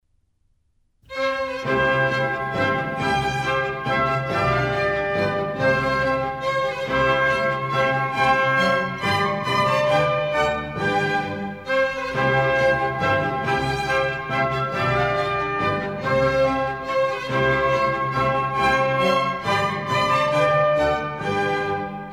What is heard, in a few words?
Minuets, C major; Mozart; Dances and Marches